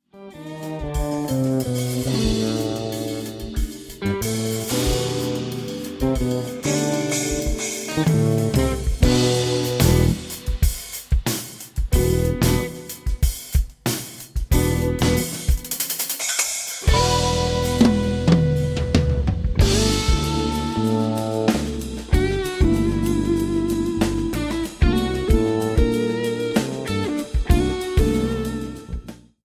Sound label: Jazz Pool Live